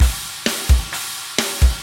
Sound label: metal